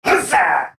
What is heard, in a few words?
Attack Long; Hollow Knight